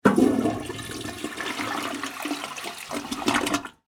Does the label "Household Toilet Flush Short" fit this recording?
Yes